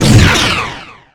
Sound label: Gun Assault Rifle Power Shot, Weapons